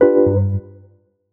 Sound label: Wurli Piano Dmin; Piano & Keys